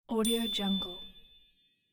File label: shine; moonduststudio